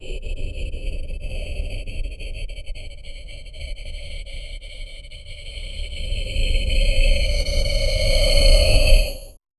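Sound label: slow and rhythmic artifici, artificial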